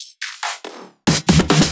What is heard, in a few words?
Fills, Loops 140 BPM